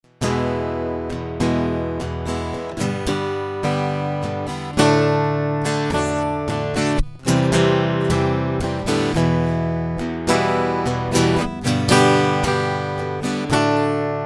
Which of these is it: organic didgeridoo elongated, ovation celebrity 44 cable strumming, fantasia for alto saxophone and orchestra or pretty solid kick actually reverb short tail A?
ovation celebrity 44 cable strumming